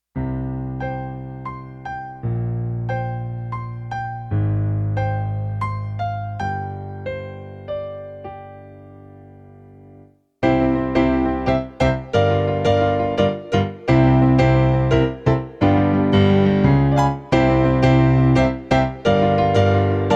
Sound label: Piano Solos; family suite songbook